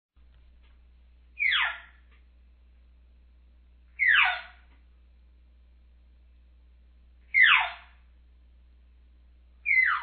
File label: cow, elk; elk 2001